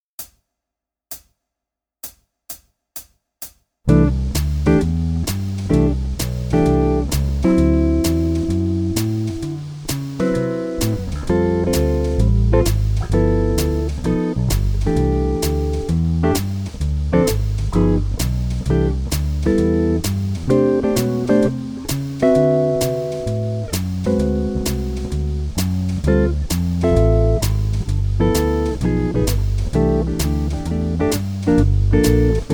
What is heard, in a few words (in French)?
grille 130bpm